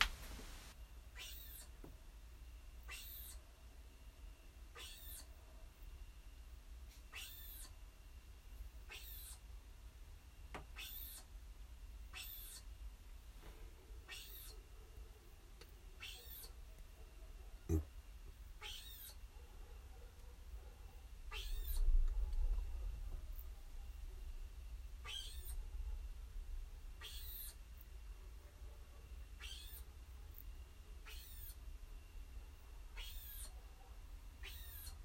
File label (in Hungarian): nyest hangja